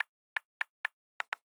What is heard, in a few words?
Phone Flick